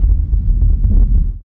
RUMBLE; East West - Phat & Phunky

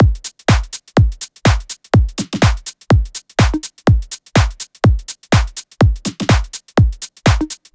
House Drum Loop 124 BPM; 99Sounds x Monosounds; Dance

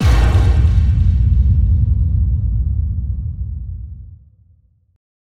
Impact

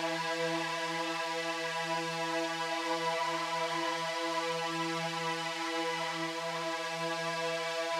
Drone; stereo toolkit samples; Non Tempo Loops

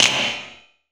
Clap, Gated Reverb; musicradar

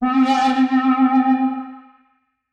Forbidden Worble C3; radiosonics

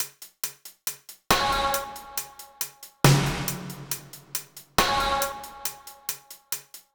Beat Hats & Snare, Grime, 138bpm; Zero-G Sound Sense Grime Scene; Construction Kits; Da Suspects

